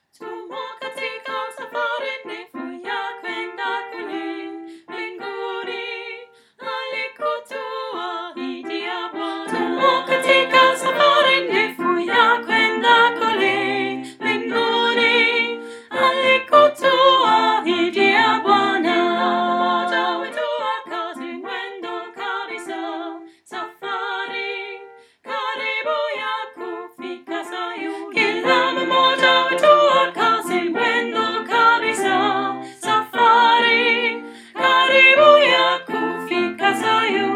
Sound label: Sopran